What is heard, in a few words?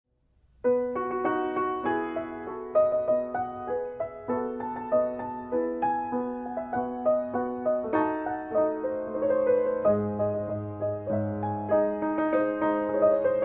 Scarlatti K380